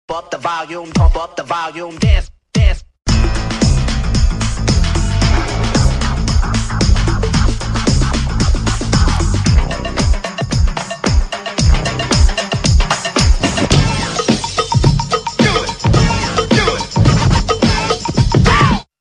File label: Nokia 5800 Pump Up